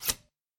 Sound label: shearing click